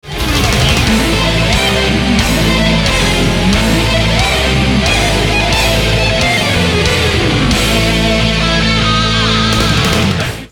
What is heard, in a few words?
sweep